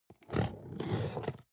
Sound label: cutter cardboard open